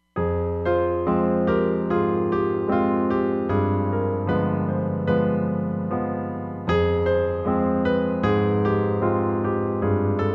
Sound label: Mellow Tune, Roland Fantom XR